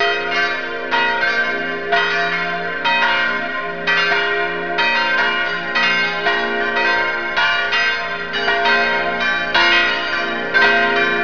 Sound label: bells